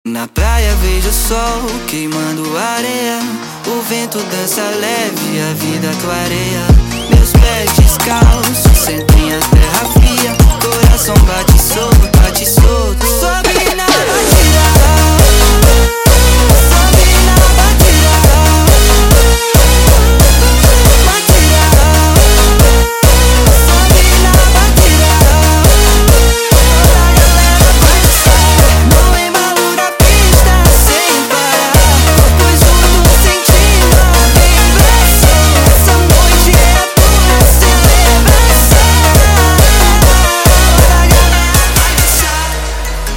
Batida Jumpstyle sped Up

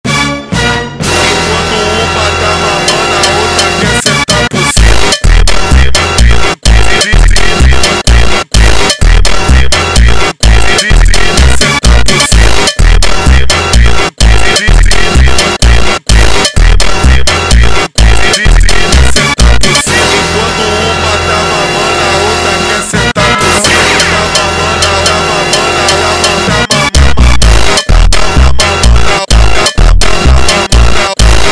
Funk slowed

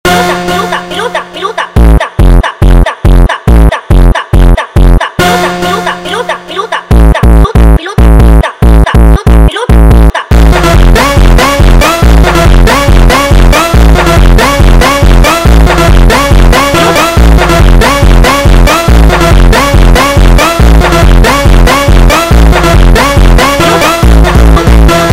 Funk

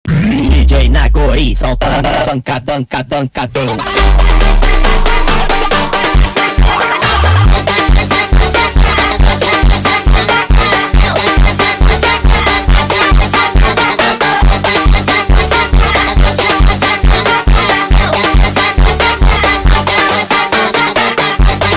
Montagem sped Up

